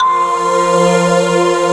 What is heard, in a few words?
AMB PAD; WORS STATION; AMBI BLEEP